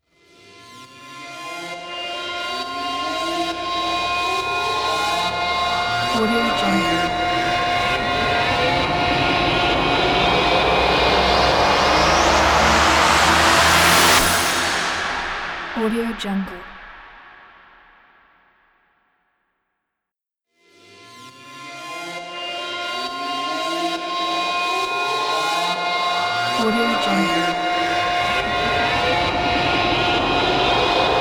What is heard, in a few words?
alarming siren riser